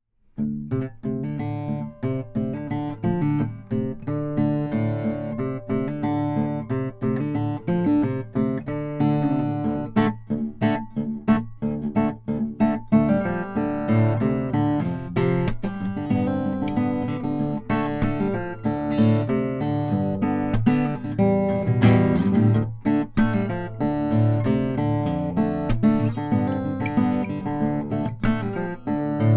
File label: lowden s35 mc fiddleback mahogany